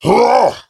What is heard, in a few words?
Basic Attack; Battlerite; Glutton